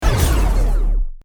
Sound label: meter rapid